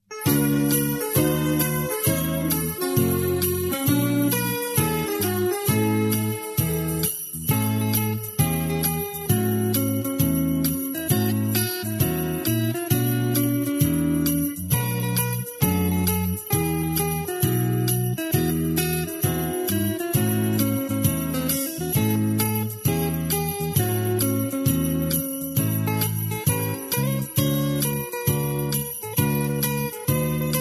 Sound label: christmas sounds, square dance music